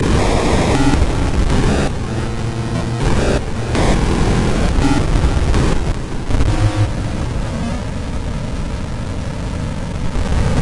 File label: noisy